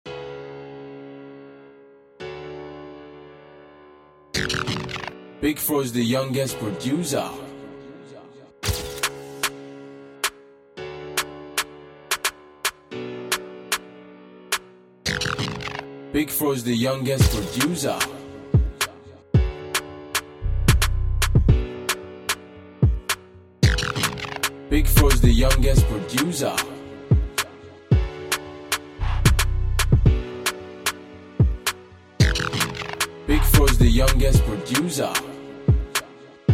Riddim Instrumental

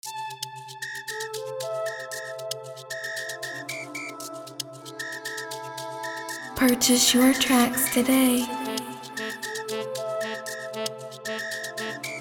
Amapiano instrumental 115bpm C major

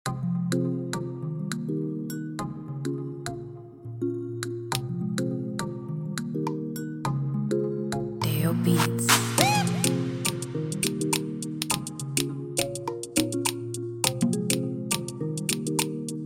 Bpm Afro

